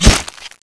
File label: knife stab; garrysmod; knife fas